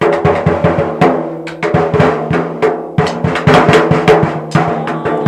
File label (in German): trommeln